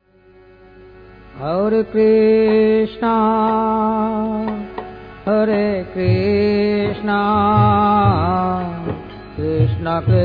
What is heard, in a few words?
slow sad sample